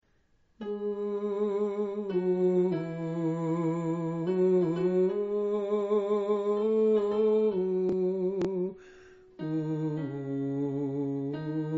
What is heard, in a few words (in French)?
alto